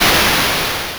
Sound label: sand